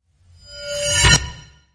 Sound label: Blade Battle Metallic Reverse